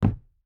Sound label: footstep rubber boots wood jump land; Rust